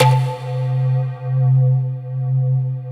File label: ETHNO BEL C2; FUNNYBELL; Synth